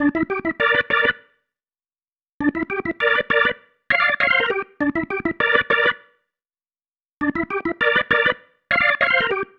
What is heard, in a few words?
Pattern, Organ